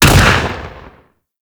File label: Hand Shotgun 2D Shot C; Warframe; Tenno